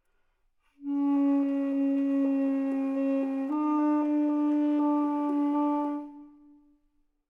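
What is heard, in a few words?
slow Tenor Sax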